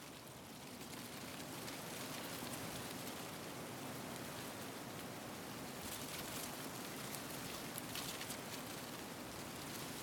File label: Ambiance Wind Trees Leaves Moderate Loop Stereo